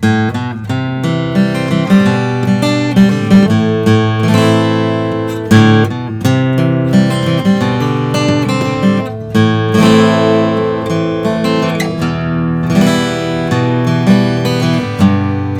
froggy bottom f12 deluxe natural

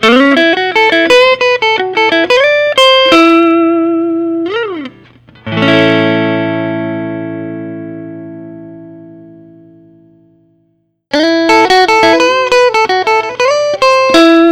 suhr classic s custom; olympic

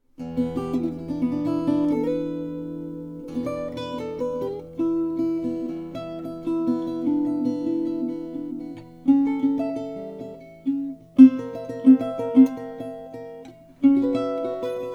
flammang el35 ts indian rosewood

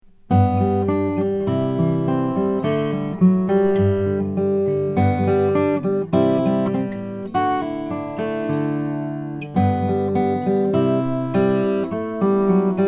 rein rjn 3 honduran rosewood

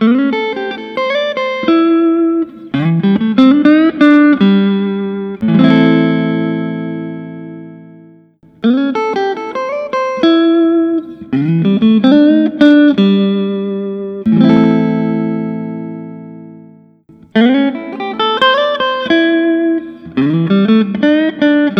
fender custom shop wildwood 10 61

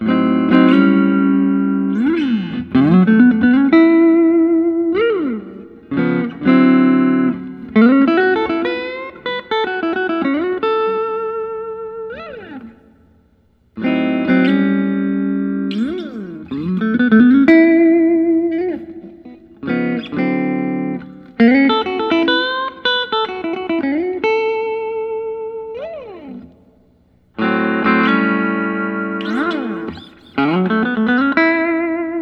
gibson custom les paul special dark ch

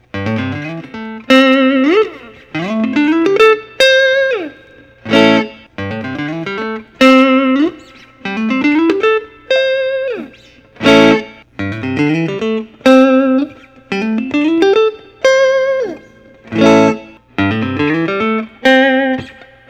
fender custom shop; todd krause

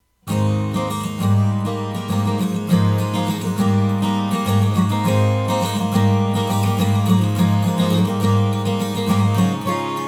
mcpherson mg5 0xp 12 string redwood top

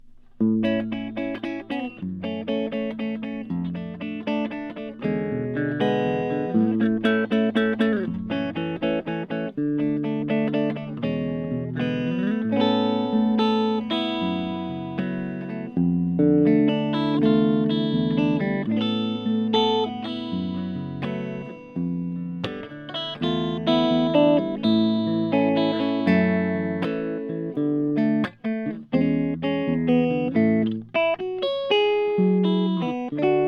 gibson es 175d cherry sunburst

